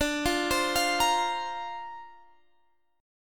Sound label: D-Minor 7th Sharp 5th D Guitar Standard, chord; Dm7#5; arpeggiated